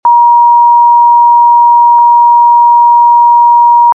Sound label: Steady